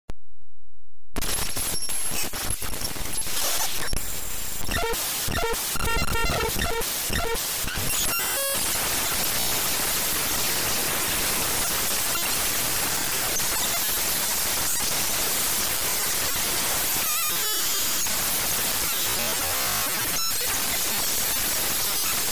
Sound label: Glitch SFX; Pibby